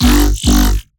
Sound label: Dirty Bass G 140, Bass Hits; One-Shots; Spectrum; Ghosthack; Color Bass Essentials